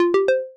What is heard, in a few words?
Wireless Charging Started; Palm PVG100